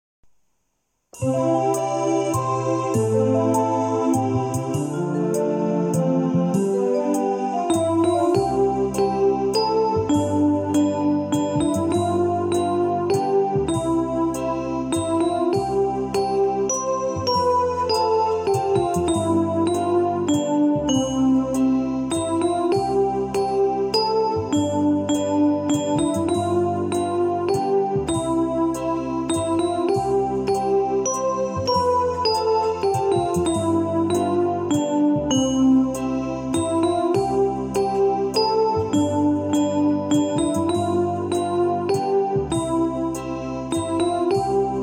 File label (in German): E-Orgel; Schneeflöckchen, Weißröckchen